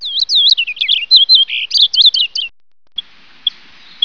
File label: purple finch